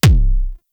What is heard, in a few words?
Rifle Punch, Weapons; Warframe; Tenno; Gyre